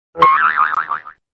Spring Notification Sound

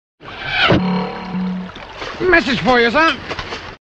External speaker SMS ringtone

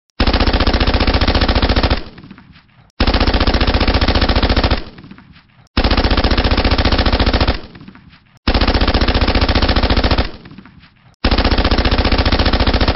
Steyr AUG; اسلحه اشتایر آاوگ

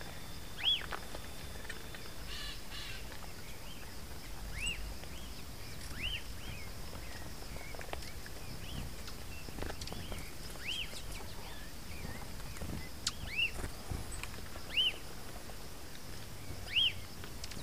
Lesser elaenia; پرنده ابروزردک کوچک

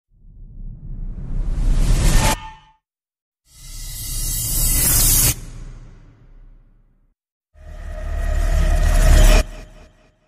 crescendo

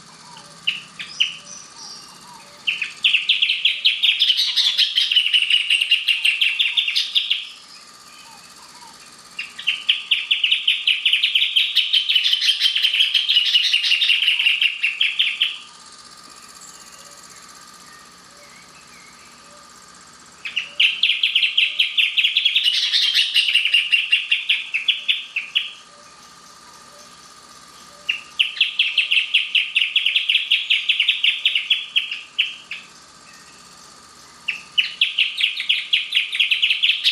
Band tailed hornero

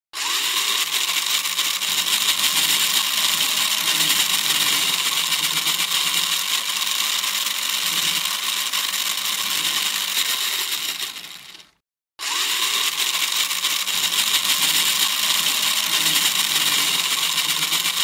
remote control car; ماشین کنترلی

